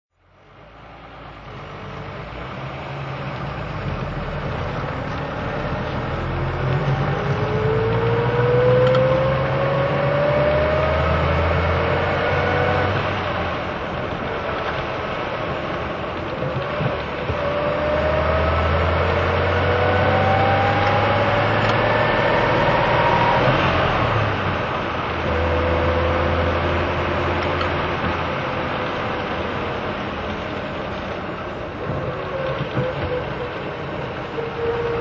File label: talbot lago t26 record coupe drive